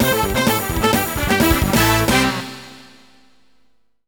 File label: JINGLE